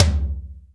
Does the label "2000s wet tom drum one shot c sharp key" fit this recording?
Yes